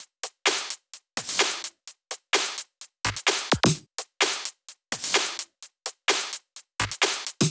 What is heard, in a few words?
128BPM Drums; Vengeance Samples; Body Kit